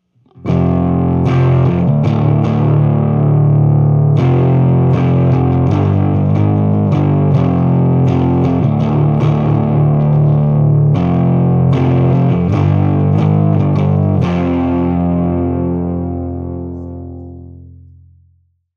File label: Distortion before